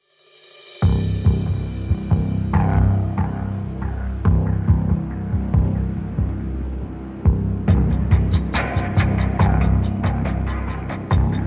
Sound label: Reduced Orchestra